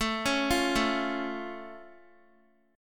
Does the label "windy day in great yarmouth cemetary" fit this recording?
No